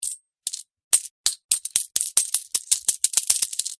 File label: money coins stack slow; Coins Stacking On Top Of Each Other Slowly; Objects